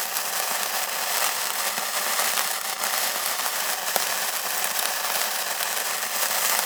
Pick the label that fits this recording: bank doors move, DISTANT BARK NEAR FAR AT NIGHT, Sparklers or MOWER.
Sparklers